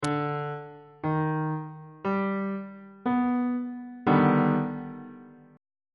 e flat augmented major 7th 3rd inversion on bass clef